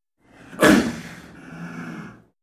Leopard Snow Growls; big cats

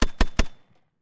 AUTO GRENADE LAUNCHER, mortars

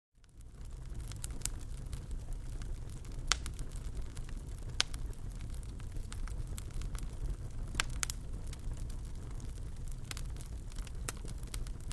Fireplace

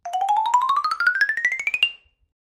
Xylophone Lng Ascend, mallets xylophone

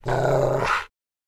Rottweiler Growl

